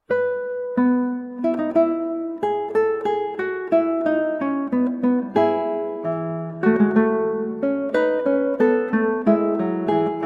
Fugue in E Major; Preludes and Fugues

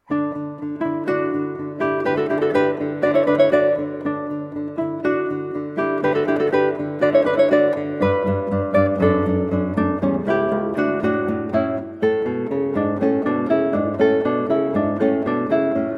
Allegro di molto from Baryton Trio, Guitar; Baryton Trio 2 Hob XI 2; Joseph Haydn

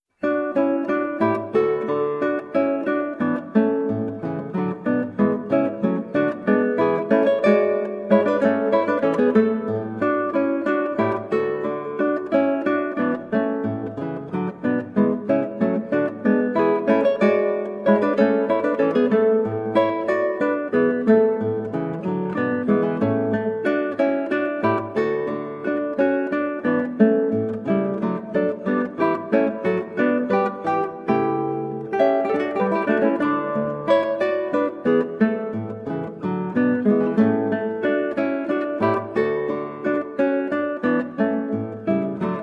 Menuet, guitar; Menuet from Baryton Trio 45 Hob XI 45; Joseph Haydn

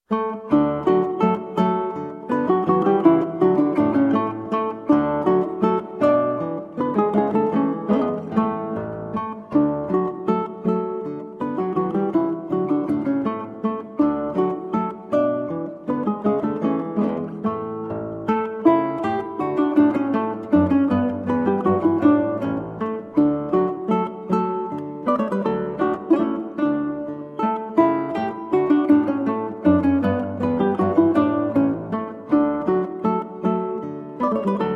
Menuetto, Ukulele and Guitar; Baryton Trio 1 Hob XI 1; Franz Joseph Haydn

